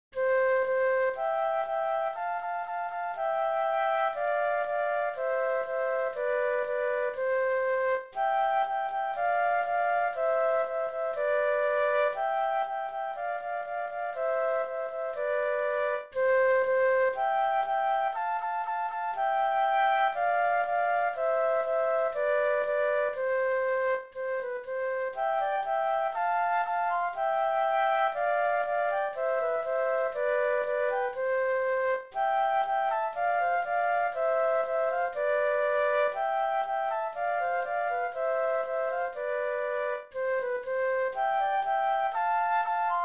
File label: Flute Duet